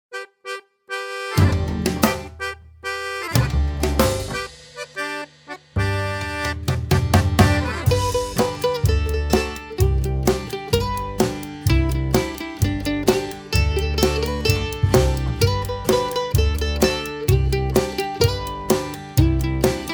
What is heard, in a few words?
Jingle Bells Jolly Old St. Nicholas